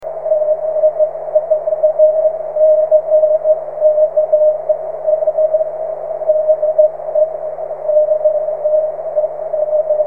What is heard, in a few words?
13cm cw; oe9erc